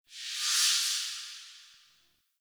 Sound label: Crafting UI Close; Warframe; Zariman